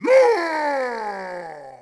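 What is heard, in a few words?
Attack Vox; Sid Meier's Civilization 4 Complete; Warlords; Units; Omen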